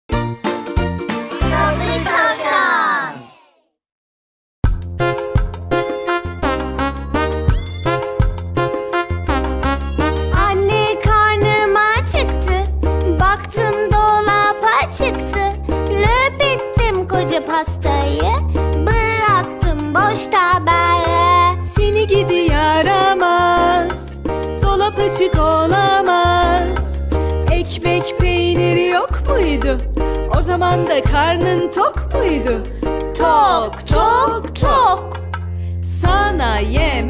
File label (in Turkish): eglenceli bebek ve cocuk sarkisi; cizgi film